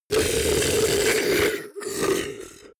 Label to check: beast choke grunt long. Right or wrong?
Right